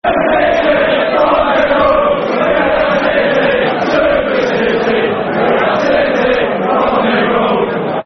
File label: We Are City, Football Chants; fanchants; Man City Mcfc